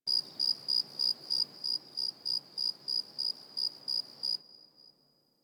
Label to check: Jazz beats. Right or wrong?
Wrong